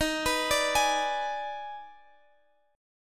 D sharp Major 7th Suspended 4th Sharp 5th D sharp Guitar Standard, chord; arpeggiated; x,x,13,16,15,16; JGuitar